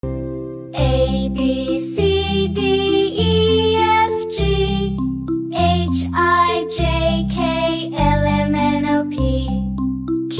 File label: ABC Song, English Nursery Rhyme